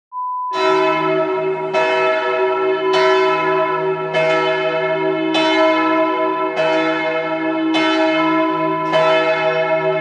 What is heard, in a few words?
Church Bell sound